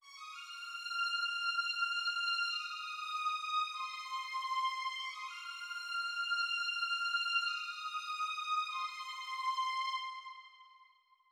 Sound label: West Coast Melody Loop 97 BPM A# Min Strings; Melodies; Loop Stems; Cymatics; Oracle Sample Pack